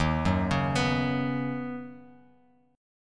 D sharp Minor 7th Sharp 5th D sharp, down, Bass Standard; D#m7#5 arpeggiated